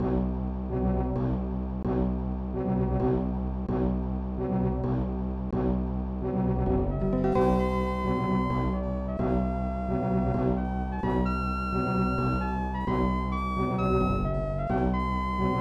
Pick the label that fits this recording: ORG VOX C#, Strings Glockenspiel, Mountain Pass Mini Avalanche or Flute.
Flute